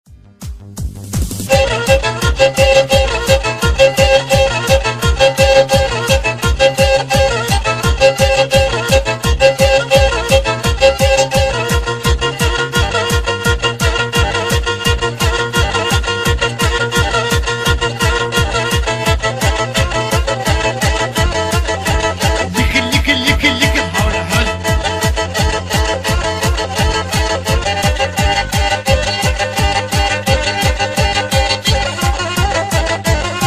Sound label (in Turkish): kemence